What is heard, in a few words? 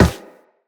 Run Metal, Footsteps; Characters; Operator